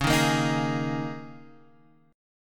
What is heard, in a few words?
Ab Suspended 2nd Suspended 4th Db